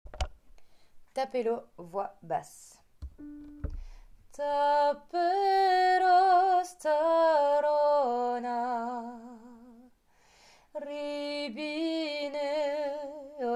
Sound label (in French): Basse